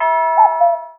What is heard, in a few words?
cuckoo